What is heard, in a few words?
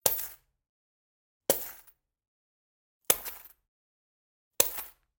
Generic Click Metal Small